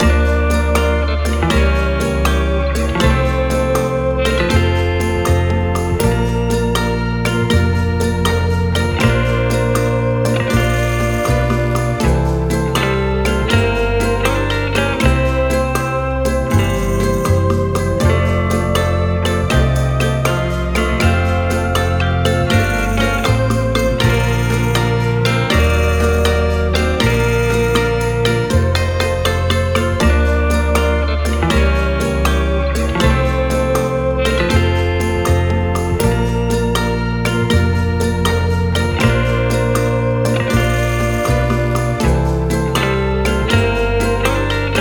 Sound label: Dreamy Daze; Solenars Edge II Champions